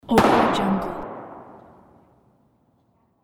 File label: petard